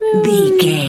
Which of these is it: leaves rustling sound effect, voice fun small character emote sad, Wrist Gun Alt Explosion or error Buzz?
voice fun small character emote sad